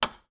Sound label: hammer hit metal x1; industrial